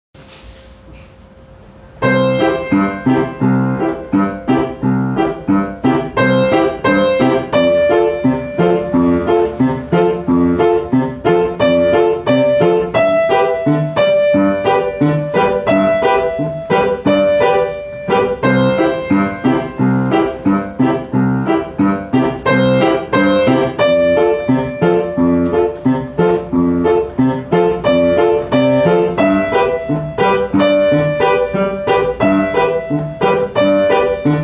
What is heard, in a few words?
Cumbia